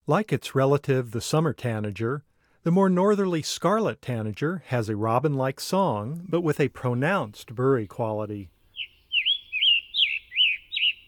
Scarlet Tanager; North America; Common Western Species; Know Your Bird Sounds

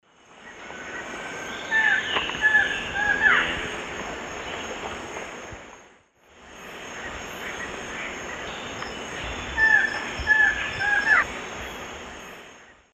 Thamnophilus murinus, song; S. America; Eastern Lowlands

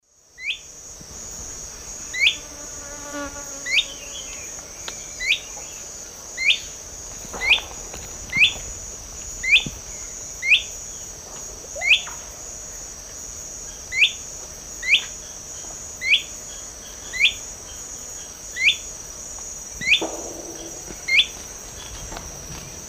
Zimmerius gracilipes, fast call; S. America; Eastern Lowlands

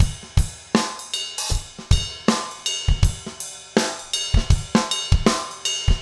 trip hop

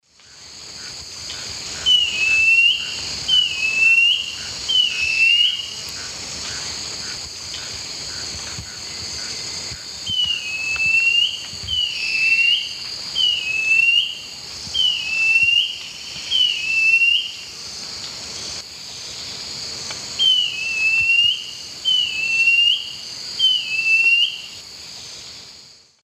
Laniocera hypopyrra, song; S. America; Eastern Lowlands

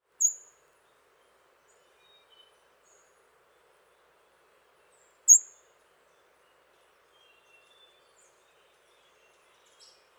Calls, Birds of the Costa Rican Cloud Forest; N. America; Natures Songs

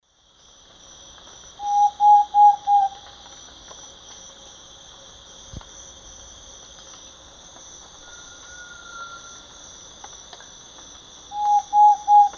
Mytmothera campanisona, song; S. America; Eastern Lowlands

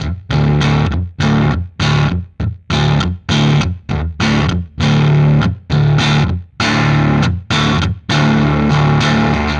Low Down Guitar; Guitars

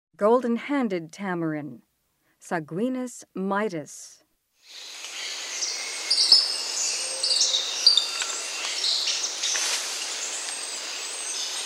Golden-handed tamarin; S. America; Rainforest

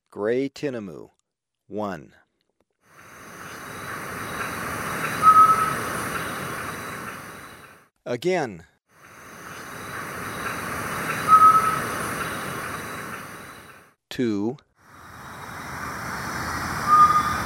Gray Tinamou, Birds of Ecuador; S. America

